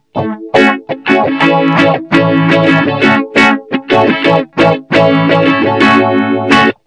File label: phaser